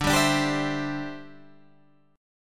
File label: D 7th Suspended 2nd Suspended 4th D